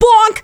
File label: hit; freak fortress 2